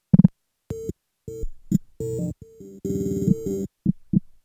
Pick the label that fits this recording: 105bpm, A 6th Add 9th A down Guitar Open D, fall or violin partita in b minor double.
105bpm